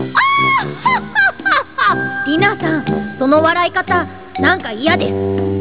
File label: hohoho